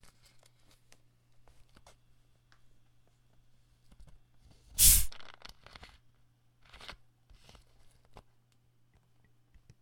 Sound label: opening a sparkling water plastic bottle